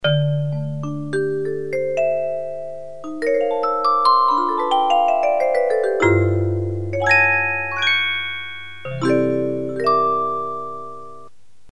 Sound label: Keyboard